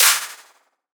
FX Clap; Antidote Advent; Infected Track Drums

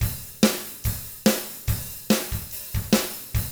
ROCK, Double Platinum Drums

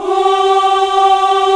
VOX, African Aahs; Roland LCDP11 Africa VOL-1

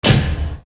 Percussion, EuroDance

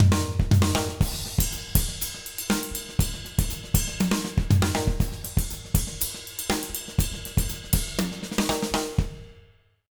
120 JROCK, Drumloops

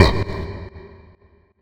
Fx Vox; Equipped Music; Loungin House

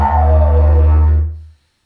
Didgeridoo Kit Samples

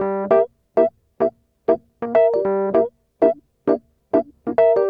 Smooth Groove Rhodes, 70s Analog Funk

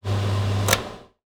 Extractor Fan, household rhythm samples Hits

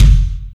BD, Drum & Bass, 170 BPM; Ueberschall; Drum & Bass Flash Trackz

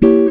GTR CHORD; Univers Sons; Jazzistic

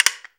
CASTA, CASTANETS; AKAI S-Series CD-ROM Sound Library VOL-5

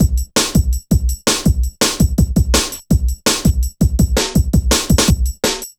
Programmed Stepz, Jungle Drum Loops

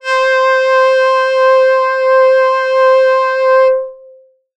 STR C5, Yamaha TX7 synth pad; Pads

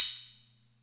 Music Percussion Computer HH open, Drum Machines; Atlantex MPC-1

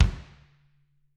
BD, Drums; Bob Clearmountain Drums I

